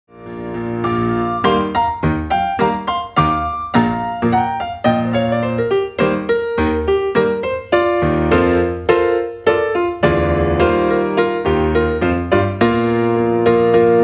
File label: piano